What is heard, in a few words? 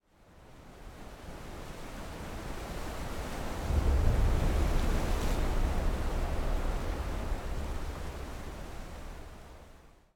wind hit